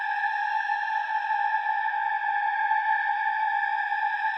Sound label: big win riser; netent